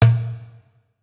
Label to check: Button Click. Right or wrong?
Wrong